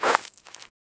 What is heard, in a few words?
short slide human body sk; friction